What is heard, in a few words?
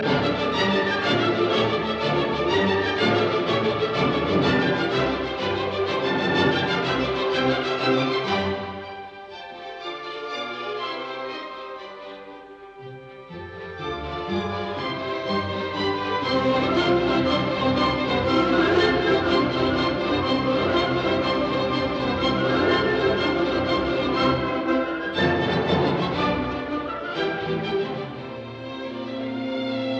Symphony in D minor Choral Molto vivace; Beethoven; Op 125